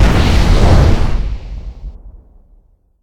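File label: incendiary grenade detonate; incgrenade; arccw go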